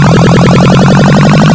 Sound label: Enemy Birth; Joust